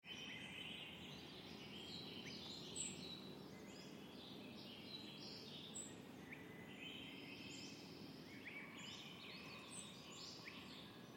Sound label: early morning forest ambience british columbia